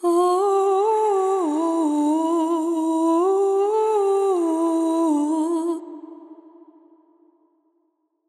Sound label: Oooh, Vocals; GhostHack